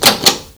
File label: Locked; Roleplay